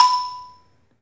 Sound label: 3D MARIMBA; 3D Sound; 3D Keys VOL-1